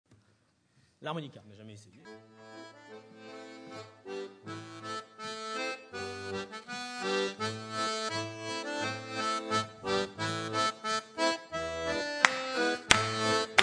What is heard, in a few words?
harmonica